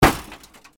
crash

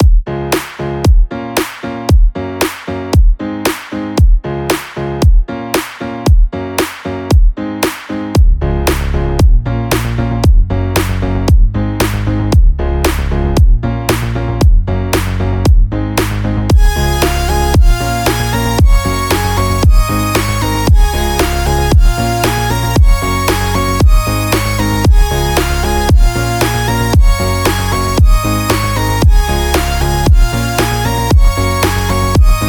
Rhythm Rumble Title Screen; chompchomps

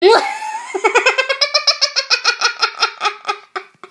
laugh

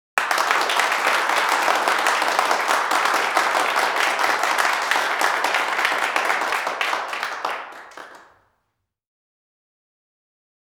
Applause Indoor Small Crowd In Large Room, Heavy

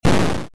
enemy Attack

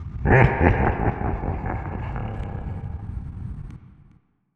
laugh

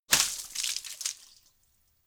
Splat

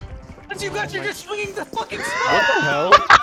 Roar

